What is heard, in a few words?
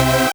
Synths Root C